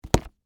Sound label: Foley, Bible, Set, Down, Book; Books (Sound Effects:Offices)